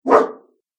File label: Dog Deep Bark; animal